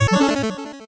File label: 8bit se